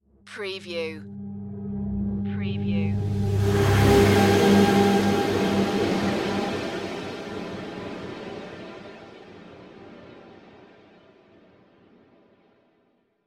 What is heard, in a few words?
disharmonic ghost flyby